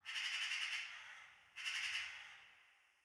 ambient winter birds

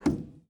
Aircrafts Cockpits Stick Roll Lim; DCS

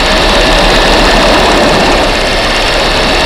vehicle sdv loop close; veh sdv npc loop close

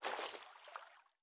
footstep water light; Rust

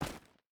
footstep rubber boots stones run; Rust

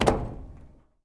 landing metal; Escape from Tarkov

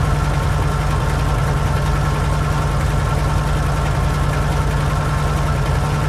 zero cockpit, engines; War Thunder

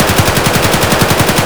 ak101 indoor distant loop; Escape from Tarkov

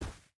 footstep rubber boots snow run; Rust

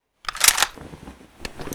Inf Weapons Foley; Red Orchestra Ostfront 41-45

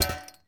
ui drop chainlink; Rust

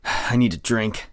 dehydrated; Escape from Tarkov

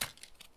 goggles drop; Escape from Tarkov

